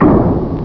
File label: CLANK